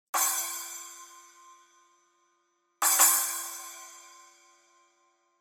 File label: Resonant Splash 9; Masterwork